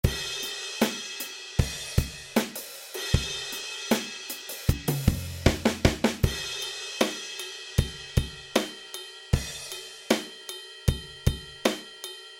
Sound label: drums